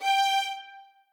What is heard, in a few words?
string